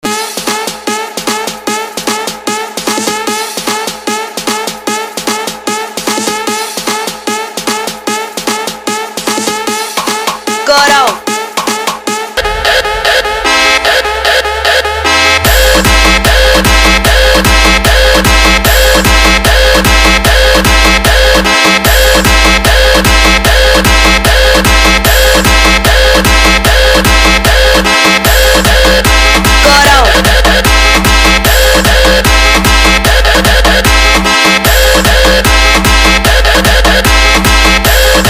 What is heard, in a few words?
Horn Trance Beat Mix; Dj Competition Beat